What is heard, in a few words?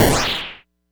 Bowl Snare, Cwejman S1